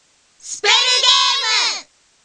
SPELL

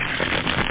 Bat; Yipe! III; Monsters

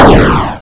boom; Glypha III; Boom 2

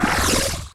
ghost; fun and bullets

